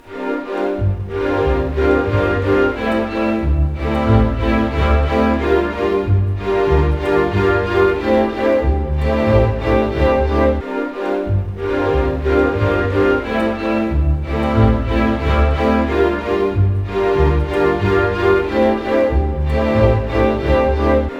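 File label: 120, orchestra; East West; Scoring Tools; Romance Eve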